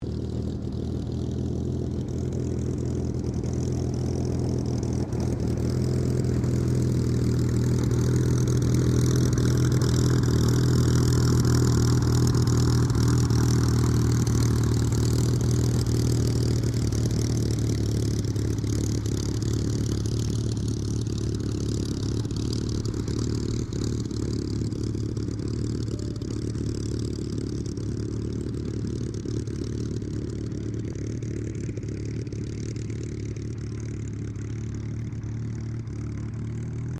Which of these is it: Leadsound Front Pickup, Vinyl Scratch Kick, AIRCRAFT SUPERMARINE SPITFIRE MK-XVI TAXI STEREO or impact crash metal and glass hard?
AIRCRAFT SUPERMARINE SPITFIRE MK-XVI TAXI STEREO